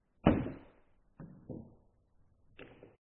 pocket ball roll, snooker

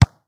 ballshot; FootgolfEvolution

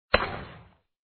baseball bat hit; Sports Games; BaseballClassic

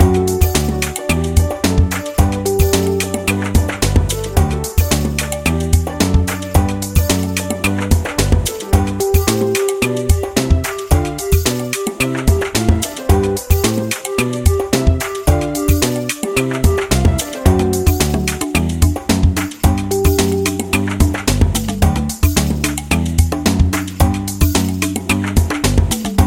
game music; Snake&Ladders

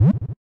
shoot, Basketball; Sports Games; BasketballPractice

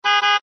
horn; MaseratiGranturismo; Racing Games

